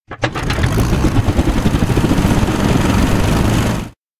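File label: electronic plane throttle; anemoi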